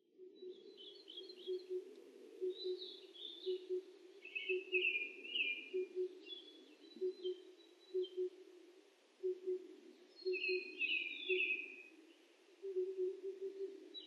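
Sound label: oriental cuckoo; tutudori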